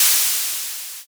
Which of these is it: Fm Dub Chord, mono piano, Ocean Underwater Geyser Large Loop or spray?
spray